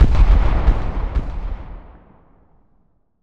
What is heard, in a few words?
bomb heavy